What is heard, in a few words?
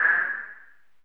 wind